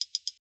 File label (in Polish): klikanie potrojne; UltimateFishing2020